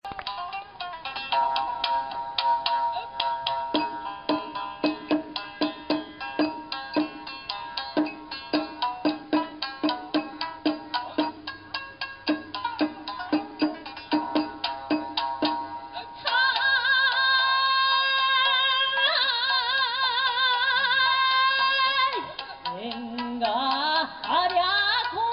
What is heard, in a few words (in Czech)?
japonska hudba; japan